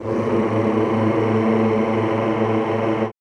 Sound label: CHO A1, Rrrr; Sound & Vision; Gigapack I CD 2; CHO Mmhh + Rrrr